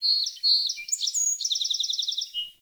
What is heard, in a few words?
Forest Open Glade Bird Close, Day; Ambience